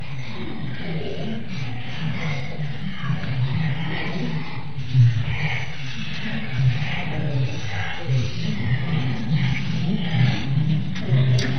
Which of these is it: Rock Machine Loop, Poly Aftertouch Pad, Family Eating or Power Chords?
Family Eating